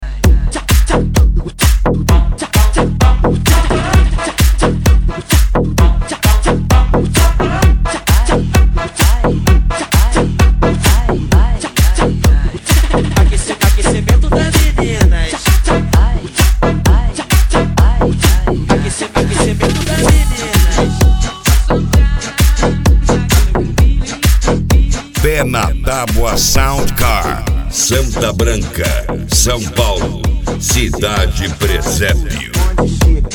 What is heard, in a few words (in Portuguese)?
sound car carnaval